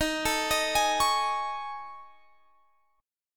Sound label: A Major 7th Flat 5th